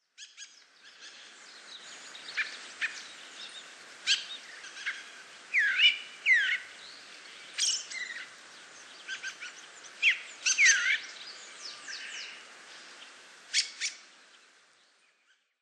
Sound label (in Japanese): tsugumi, utsunomiya